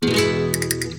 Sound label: Castanets; DIRAC